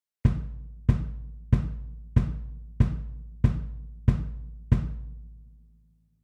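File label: bass drum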